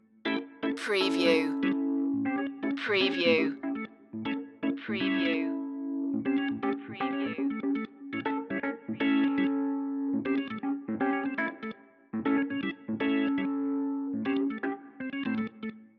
funk soul king loop

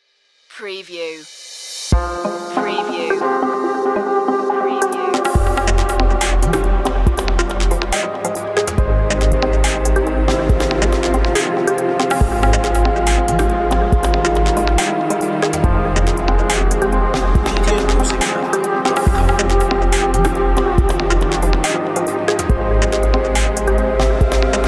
deep dub garage

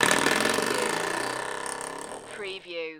Door Stopper Movement; Sound Effects Bible

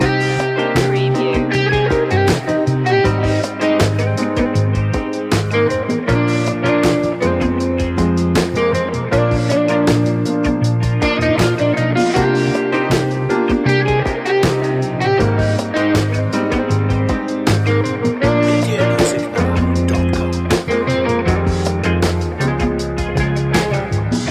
loop

